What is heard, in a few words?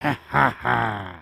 Walk, creatures organic